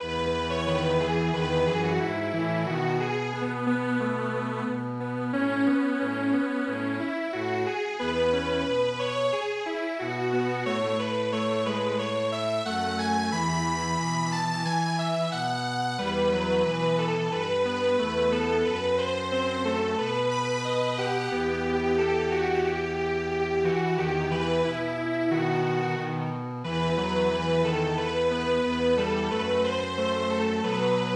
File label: Strings Quartet